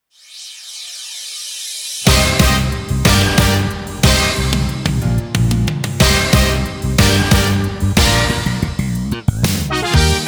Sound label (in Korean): 군민의노래; buan